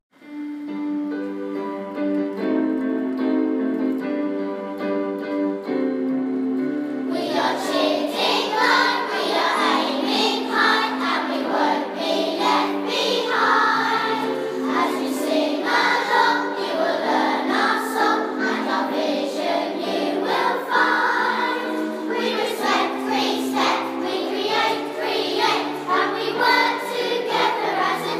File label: Children Singing